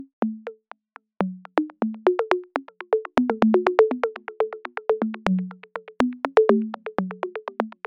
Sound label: 122 BPM Bongos; mmb drum arsenal vol 1